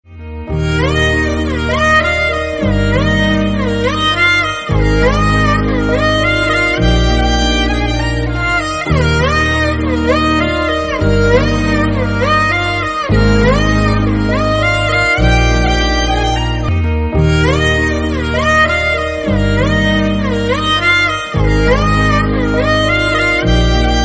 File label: Violin BGM, ringtones